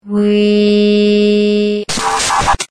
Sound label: weee; glados